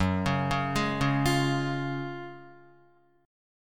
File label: F sharp Major 7th Suspended 2nd, Guitar; F#M7sus2 arpeggiated